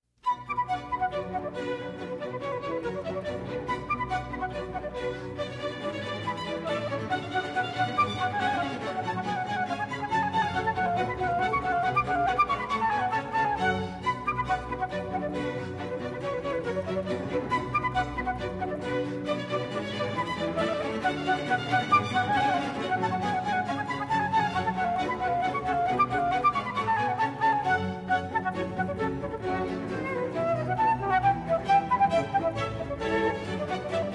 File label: Badinerie for Flute; Bach Badinerie from Suite No.2 in B Minor